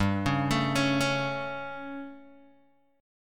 G Suspended 2nd Flat 5th G, Guitar, Open D

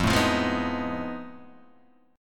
F 7th Sharp 9th Flat 5th, Guitar, DADGAD

